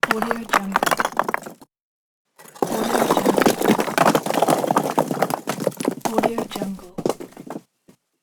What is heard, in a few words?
pile of stones